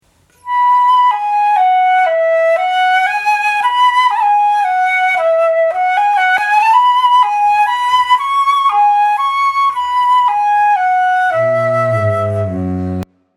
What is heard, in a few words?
Legato; Lyt til klassisk; Morgenstemning